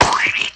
Lose Bubble Bounce

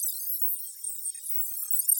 Score amb Loop

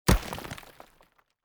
Stone Impact

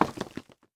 Rock Impact Medium

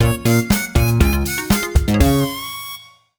Door To Egg Launch Land; Mini Mario & Friends: amiibo Challenge

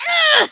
Barrel Bail Female; Kelly Slater Pro Surfer

